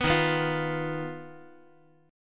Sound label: Eb Suspended 2nd Sharp 5th, Guitar; Ebsus2#5 strummed; JGuitar